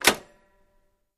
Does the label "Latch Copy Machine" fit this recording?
Yes